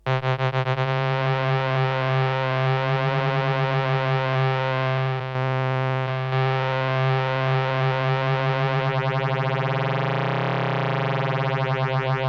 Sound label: Synth Freq Shifter up and down; Red Panda Radius